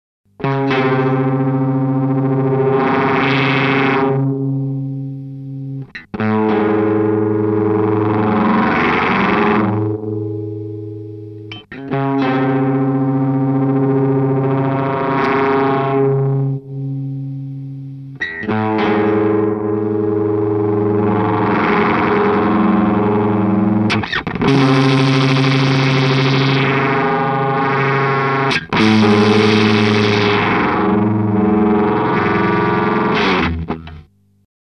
Korg Nu Tekt HD-S Mischung aus Schaltkreisen, Spiel mit Gitarrenvolume Poti

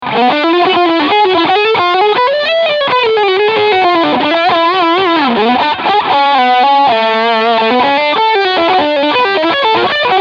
Seymour Duncan Diamondhead Verzerrer Pedal Full Mids

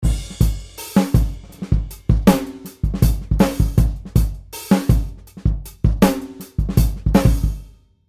Kit Ohne Amb

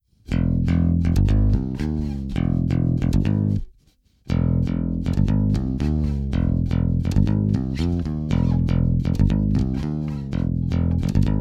Eich T1000 Dry und Low Mid Boost Plektrum mit Godin Fretlessbass

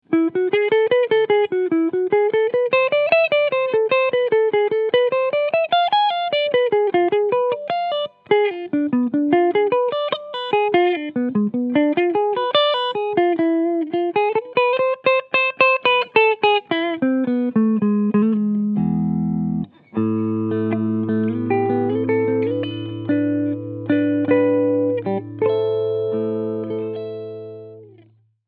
Ibanez AM93ME NT Cleansound beide PUs

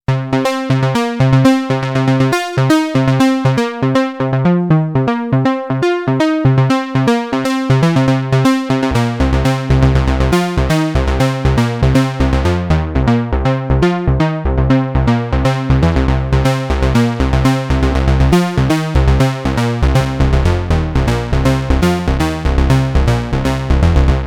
Oktavlagen

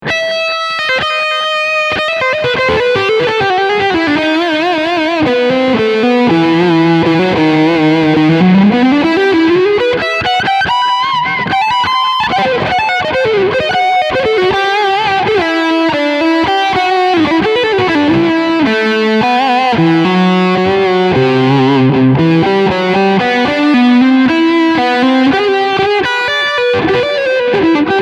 Steg HB Overdrive

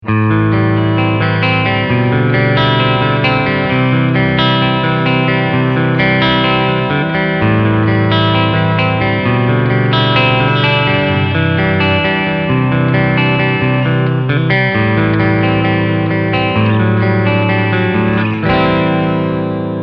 Clean Picking beide PUs

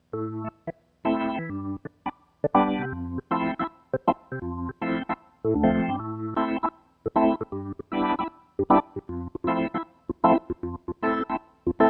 Orgel

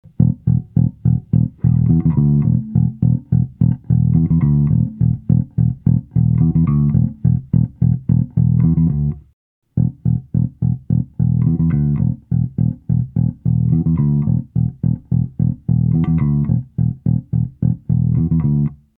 Mit Compression

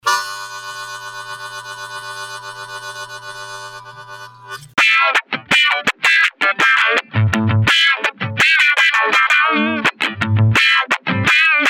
einzelloops

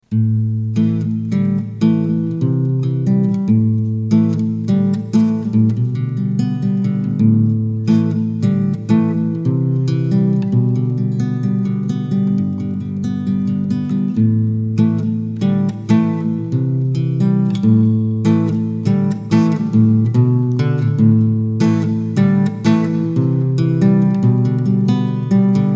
Akustikgitarre mit eing. Mikro Chorus

